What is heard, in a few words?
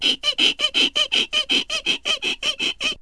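squeak, cartoon; Wired for Sound Pro CD; Aristosoft, Inc.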